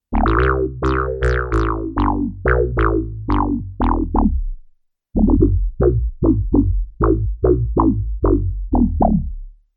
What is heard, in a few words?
Arturia MicroFreak Weird Bass